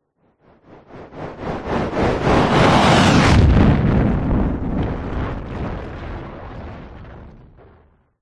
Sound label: science fiction ufo spacecraft fly by on fire explode; zapsplat